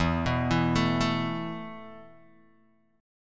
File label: E Suspended 4th E, down, Guitar, Open D